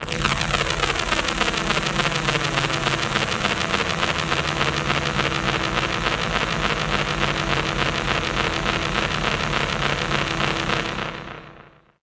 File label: mad radio, special FX twisted; USB Soundscan; AKAI